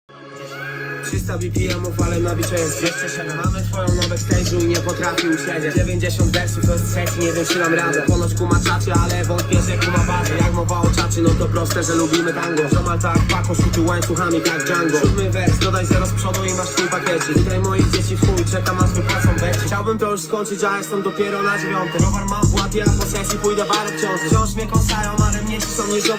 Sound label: 300 BPM